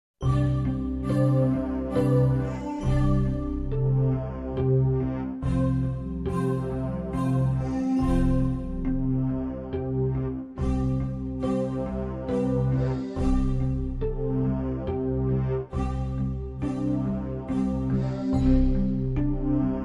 Slow Pulse Voices Neutral

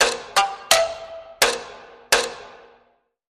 Warped Ticks 3

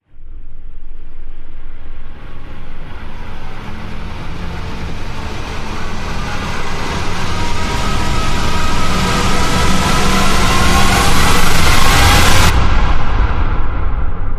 Riser

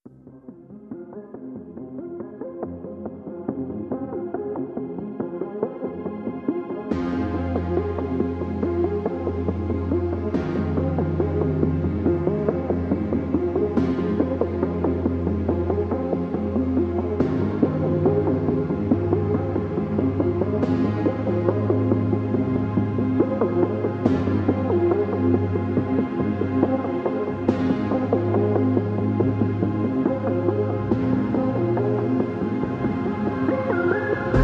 Synth Stem

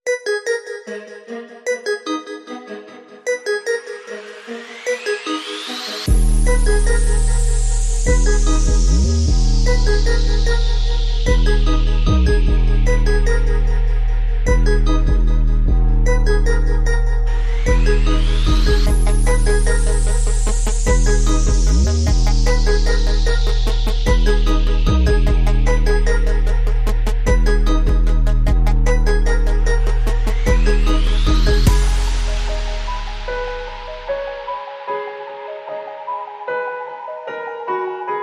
No Perc

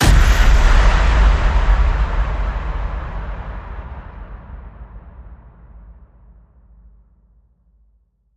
Hit Big

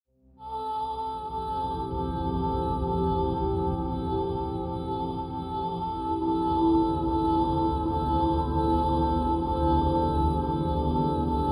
No Voice No Lead Cello

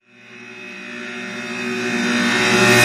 Game Buzzer Suckback

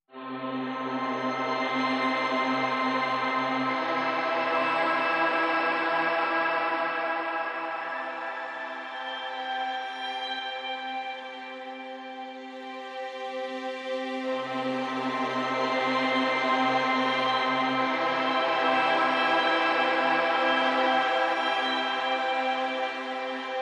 Strings and Pad Mix

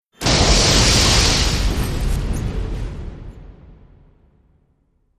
Explosion

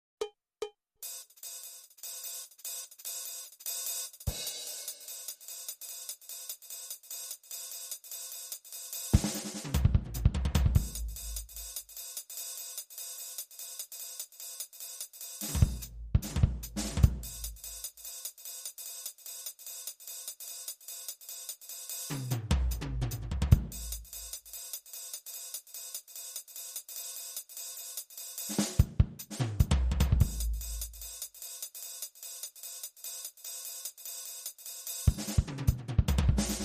BeBop Loop, Stereo Drums Only

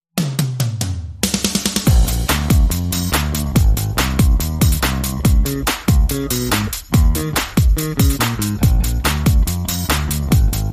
Drums And Bass Mix

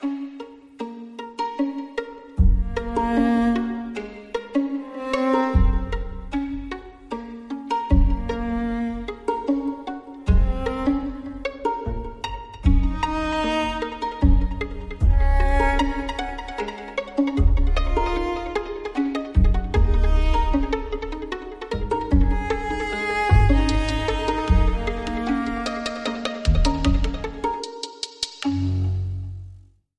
Stringing

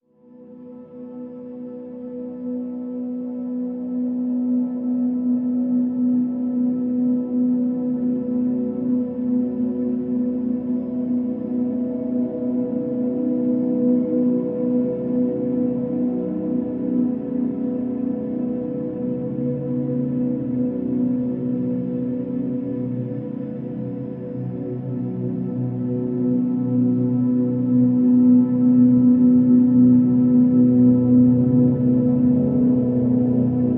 Pads Distant Lights (B)

